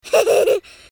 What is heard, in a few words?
giggle; cutiepugs